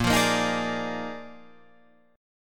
Bb 11th, Guitar, Drop D

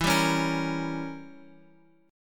E Diminished 7th, down Guitar Open F; Edim7 strummed; JGuitar

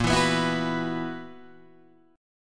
B Major 7th Suspended 4th A sharp

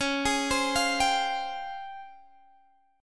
C sharp Major Sharp 11th, Guitar Standard; C#M#11 arpeggiated; x,16,18,17,18,15; JGuitar

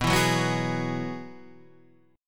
B 6th Suspended 4th B, guitar chord; B6sus4; strummed

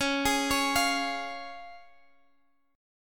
C sharp Major, Guitar Half Step Down

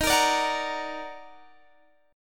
D sharp Minor Major 7th, Guitar Open D

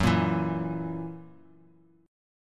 Gb 7th Gb, Bass Standard; strummed

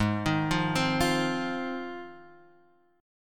Ab 6th Ab, Guitar, Standard; arpeggiated; JGuitar

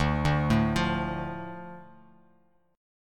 D Minor D Bass Standard; Dm arpeggiated; 10,5,7,10; JGuitar

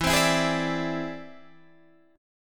E Augmented 7th E down Guitar Standard; strummed

